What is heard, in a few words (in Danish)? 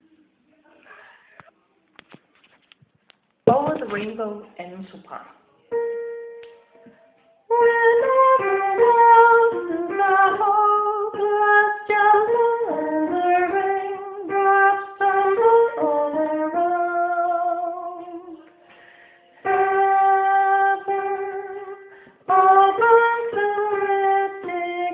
2. sopran